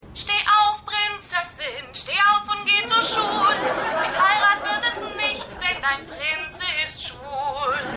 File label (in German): Wecker Gesang; Stimmproben